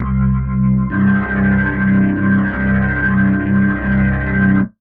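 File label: Low org, organ; орган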